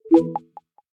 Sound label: NFC Initiated, ui; Google Pixel 3